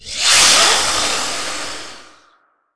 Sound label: spider threat